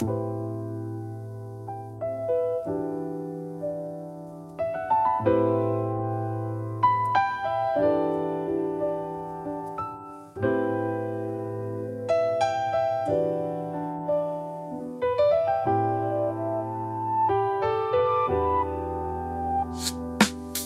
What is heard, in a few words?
Instrumental; Golden Age 005; Studio rap